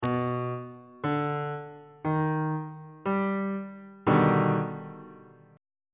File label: d sharp augmented major 7th 2nd inversion on bass clef